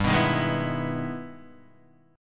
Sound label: E Major 7th; Emaj7/G; strummed; JGuitar